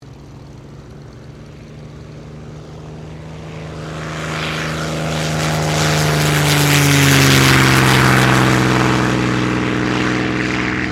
AIRCRAFT CAC CA-18 MUSTANG MK23 TAKEOFF; CA-18 /P-51 Mustang; Airplanes; Vehicles

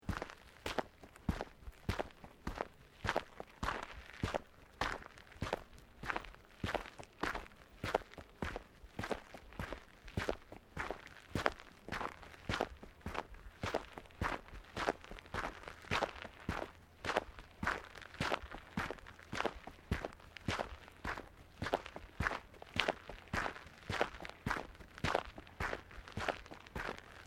Footsteps Boots Gravel Fine Sequence; Footstep Foley; Footsteps (Sound Effects:Human)

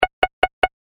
A Series Of Clicks; Andrew Fleming

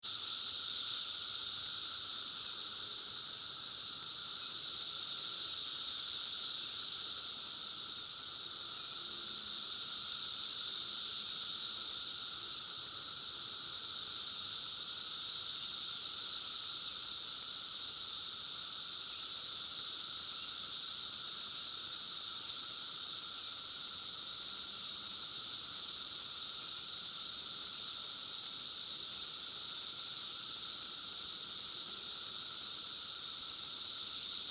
Cicadas; 17 Year Cicadas; Insects

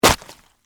Splat Crunch Heavy; Texture; Splat Sound Effects; Splats (Sound Effects:Objects); BlastWave

